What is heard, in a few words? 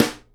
snare GLASS, Live Snares